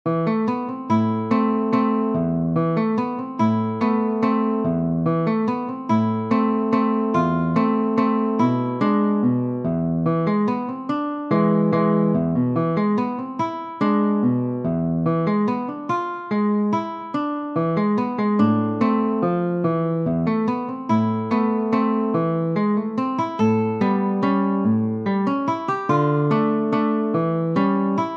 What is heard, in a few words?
waltz, guitar